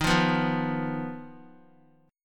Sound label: Eb Suspended 2nd Sharp 5th, Guitar; Ebsus2#5 strummed; JGuitar